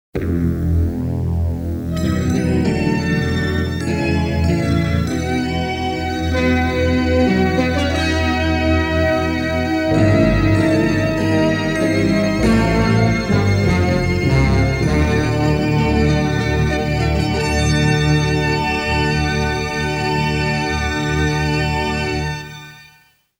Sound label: fanfare